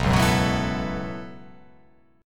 B 7th Suspended 2nd Suspended 4th down, chord

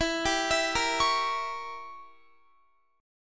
E Diminished 7th E, Guitar; Edim7; arpeggiated

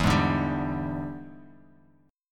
E 7th Sharp 9th, chord; E7#9 strummed; JGuitar

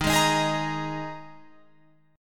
Eb Suspended 4th, Guitar, Open F; Ebsus4; strummed

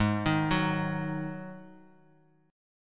Eb Minor Double Flat 5th Ab; Ebmbb5/Ab arpeggiated

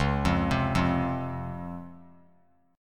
Db Major 7th Db down; arpeggiated; JGuitar

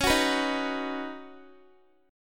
Db 7th Suspended 2nd Suspended 4th, Guitar; Guitar-4F,4A,5C,5G,5C,6E; Db7sus2sus4 strummed

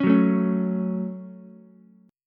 F sharp Minor, Ukulele; strummed; 6,6,5,4

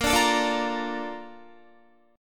Bb Major 7th, Bb, down, Guitar; strummed

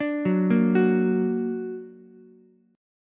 A sharp Augmented, Ukulele; A#+ arpeggiated; 7,6,6,9

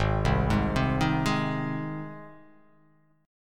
Ab Major Ab down; arpeggiated; JGuitar

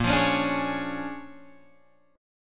Bb 7th Sharp 9th Bb Guitar Open D, chord

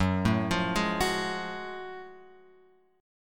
F sharp Minor Major 9th F sharp; F#mM9 Chord {2 0 3 1 X 2} arpeggiated

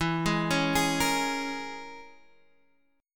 E 6th Flat 5th, down Guitar Open F; arpeggiated; chord

